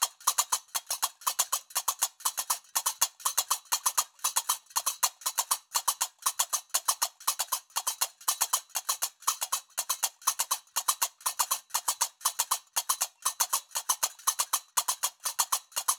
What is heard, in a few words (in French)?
loop binaire tempo 120; Qaraqab; qraqeb; Maroc